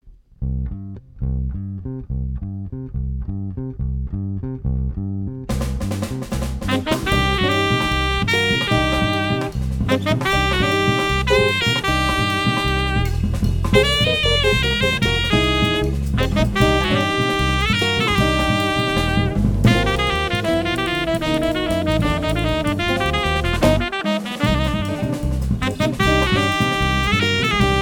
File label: quartet, latin ensemble